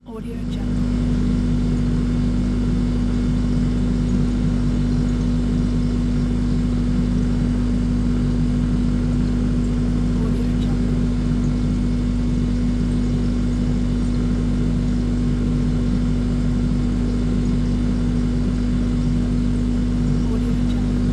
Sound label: refrigerator humming